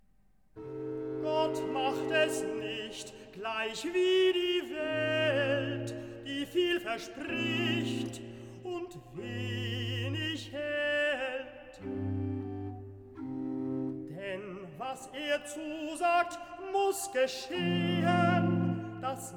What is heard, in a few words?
Recitativo Tenore; Bach Johann Sebastian; BWV0086; Wahrlich Wahrlich Ich Sage Euch; Gott Macht Es Nicht; Kantate Rogate